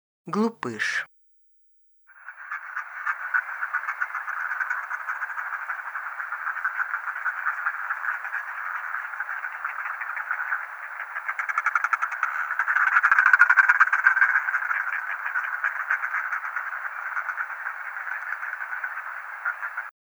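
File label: northern fulmar voice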